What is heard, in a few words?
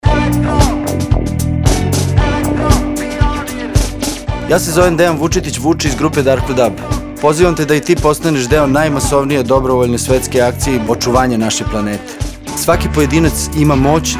radio dzingl dub; sat za nasu planetu2012